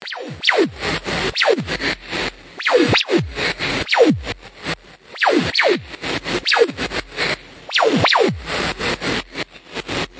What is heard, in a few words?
094 noise Found Percussion; 074 to 128 bpm